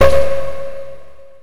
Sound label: kill